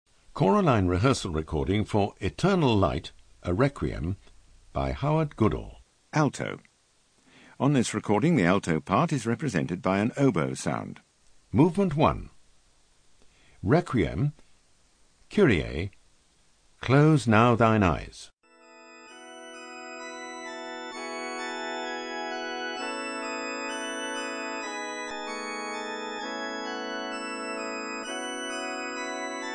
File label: Alto